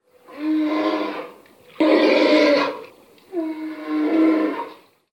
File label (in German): Europaeischer Braunbaer Ursus arctos arctos; Tierstimmen